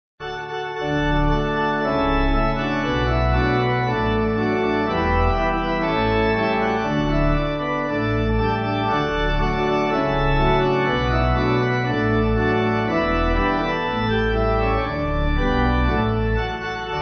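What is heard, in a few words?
Pipe, hymn backing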